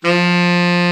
BRA TENOR, SAX; Best Service Gigapack 1; BR SAX 2

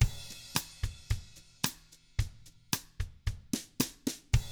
111 COUNTRY; ILIO; Double Platinum Drums 1

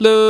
VOX LOO A4, Scats Choir; 90s Sample CDs; Club-50 - Foundations Roland; Short Scats

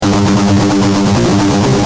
G Jam, Guitar; Industrial

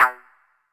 Stabs, Elektron MachineDrum SPS1 MKII

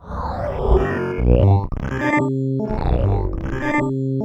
CUT-OFF; 90 s Sample CDs; NiceBeats; SynthLine VOL-2

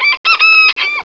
ROOSTER; CMI Sound Library Rev 1.3

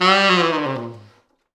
T Sax falls, Horns Sax

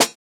Snare, jungle breaks drum sounds

